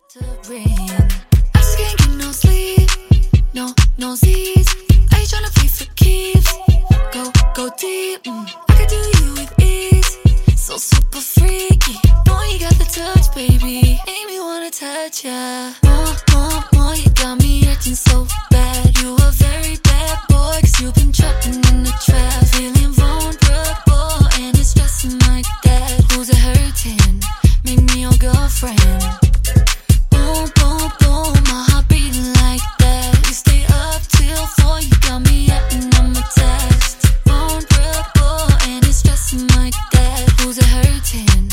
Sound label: Funk Intro Outro 134 Bpm